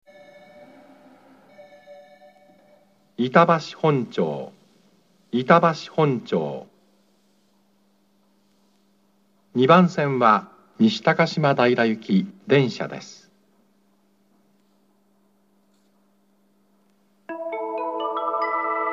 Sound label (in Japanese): itabashi hontyo 2 bansen, subway; 到着放送＋発車サイン音（西高島平行）; 板橋本町; 都営地下鉄三田線 西高島平方面; 板橋本町駅ホーム